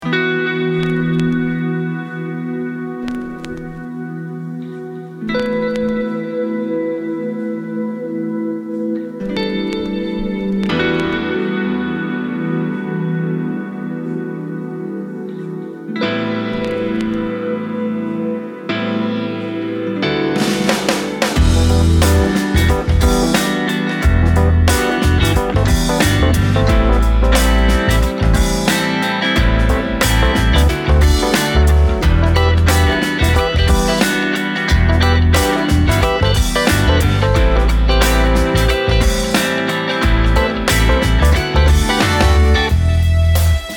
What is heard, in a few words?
Modern Pop Production; This Is Gold: Modern Pop Production